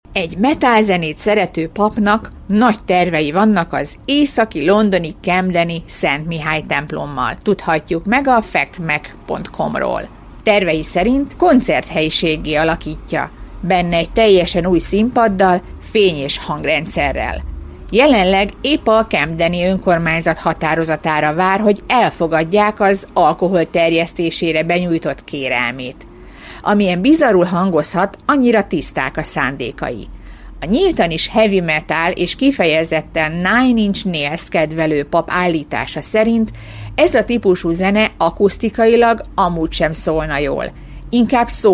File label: Heavy Metal